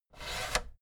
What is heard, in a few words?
fader plastic scrape double up medium